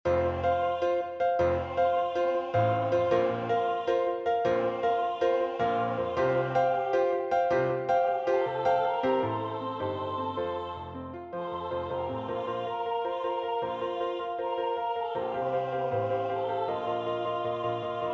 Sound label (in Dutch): Bas